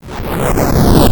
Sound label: Death